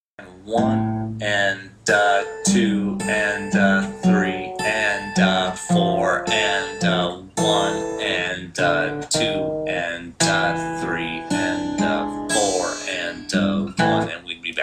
classic turnaround example

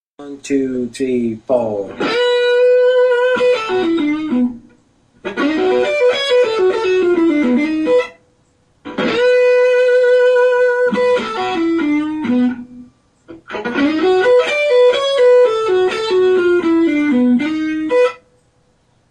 lick

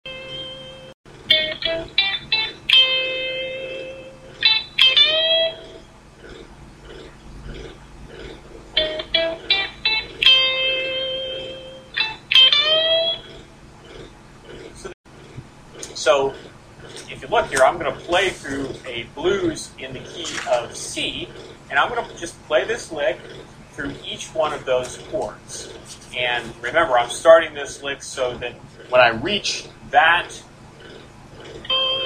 c major blues lick, guitar

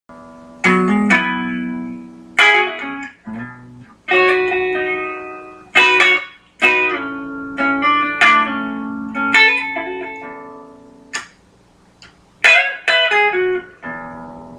lick, guitar

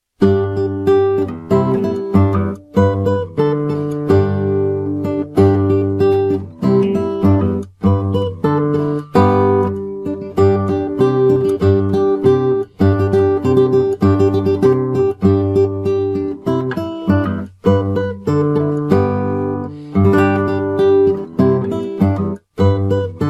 old macdonald had a farm fingerstyle

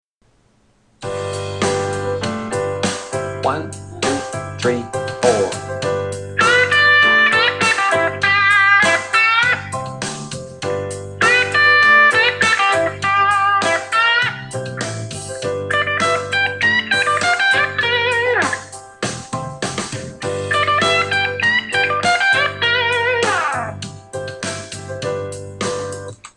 licks played in the key of g, guitar

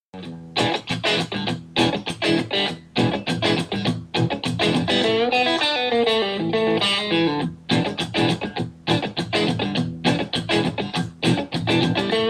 blues guitar filler lick

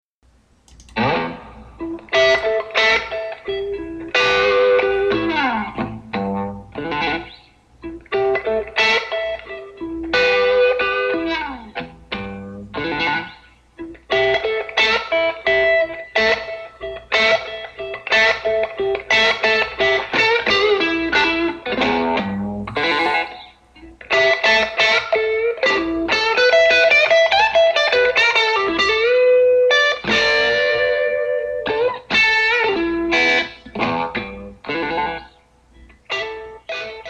blues lick embellishment

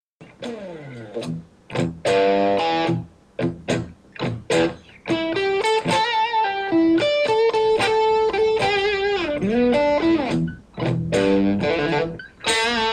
slow blues lick way up, guitar

